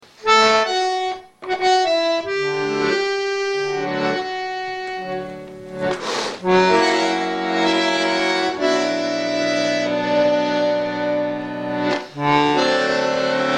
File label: Bandoneon